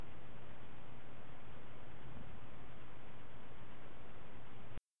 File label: Shaking